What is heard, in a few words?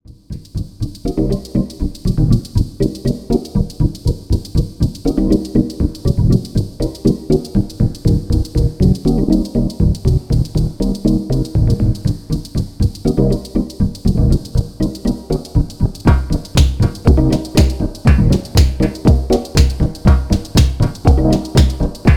Single MIDI Out Arr; Future Retro Zillion